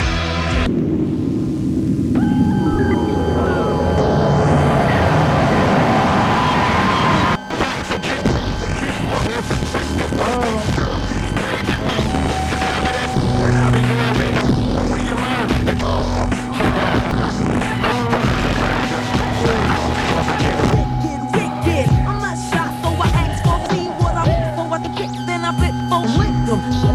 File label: snippet fm tape scifi beats